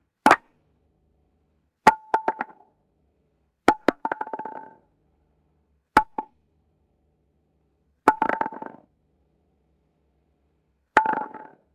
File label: wooden dowel dropping and bouncing on rock